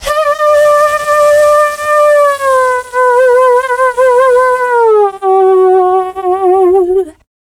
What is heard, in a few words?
VOICE FX, Dance Vocals